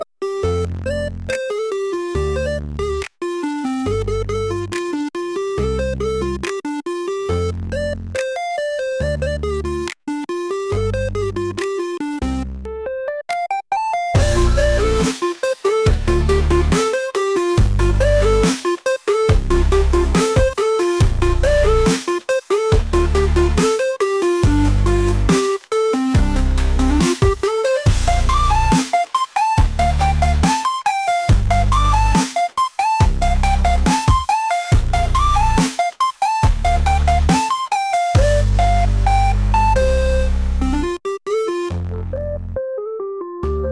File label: tune, chiptune